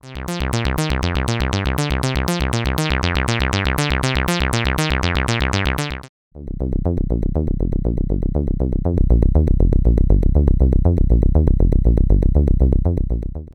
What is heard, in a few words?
Mixer EQ